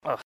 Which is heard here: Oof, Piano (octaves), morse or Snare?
Oof